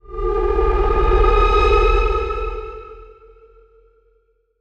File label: Stinger Rising; starcraft 2 wings of liberty